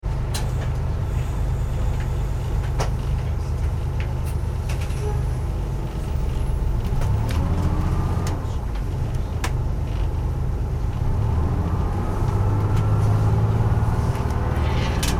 transportation bus driving away stop short internal; Bus Pulls Away , Drives Very Short Distance And Stops - Internal Recording; Busses; Vehicles; McKinney Sound